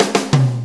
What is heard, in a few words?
4-4 Fill, Drum Loops; Sonic Foundry (Sony Creative Software); Loops & Samples Drum Tools